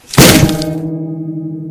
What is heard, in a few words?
guitar smash